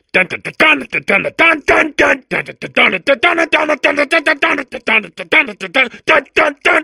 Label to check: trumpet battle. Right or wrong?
Wrong